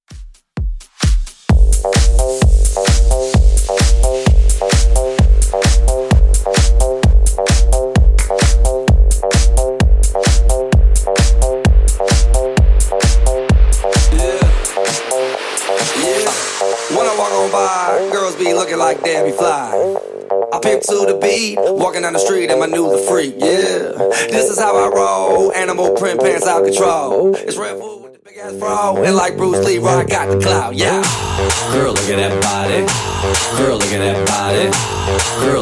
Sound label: 2ks Redrum 130bpm